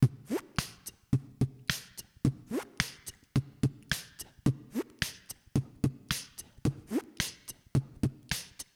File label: 8beat